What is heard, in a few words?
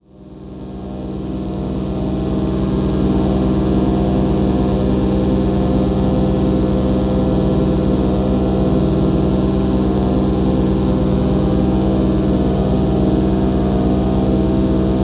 Minimal Drone; One Instrument